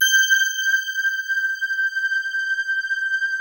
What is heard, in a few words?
BELL 2 G5; Music Store - Claudius Diemeter Schwerlos; FINE ART 5